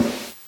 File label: SNARE, NES DPCM